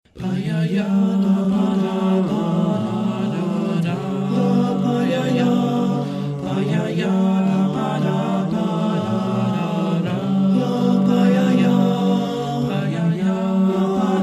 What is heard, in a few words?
male vocal group; ttbb